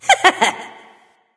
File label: "heh heh"; smite; voicepack; nike